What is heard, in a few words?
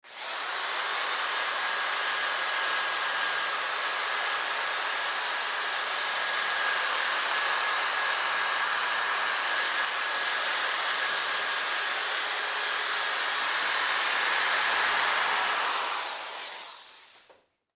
Power Buffer Start Up Run Shut Off; Machinery; Industry